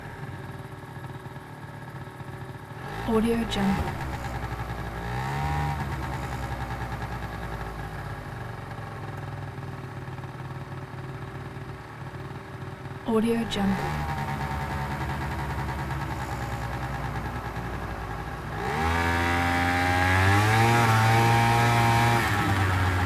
scooter driving varying speed; soundbits-soundfx

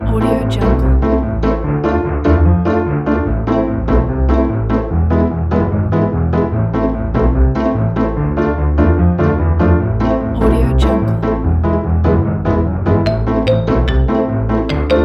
funny

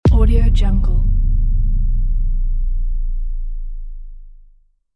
dark horror movie impact; sviridpavlov

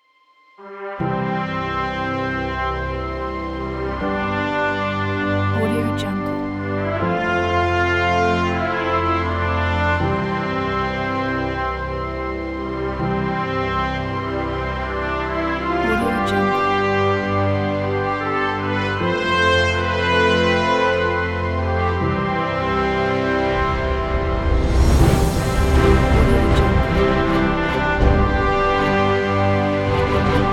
presidential; florewsmusic

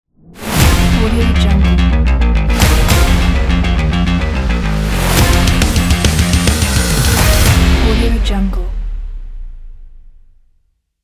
bass guitar rock logo

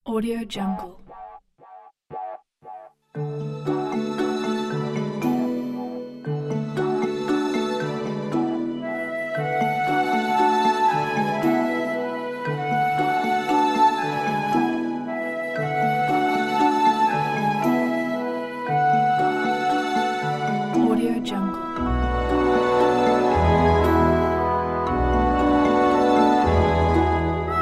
light cinematic dramedy

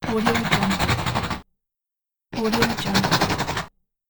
scribble

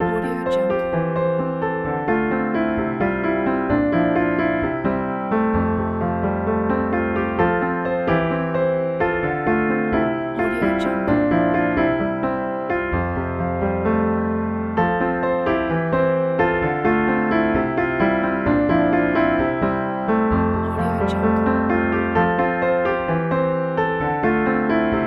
joyful lighthearted piano; jkmusic

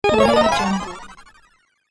bonus booster

